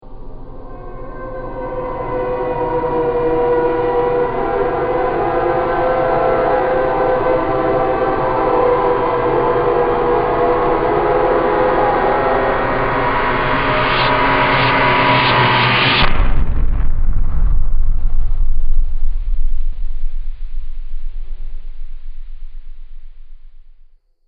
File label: Movie Impact Howling; Miscellaneous Impact Sound Effects